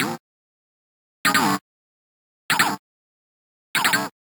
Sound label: Arcade Click Negative